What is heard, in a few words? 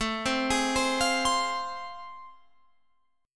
A Minor Major 7th Sharp 5th, Guitar Open F; arpeggiated; AmM7#5; JGuitar